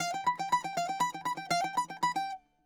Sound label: bouzouki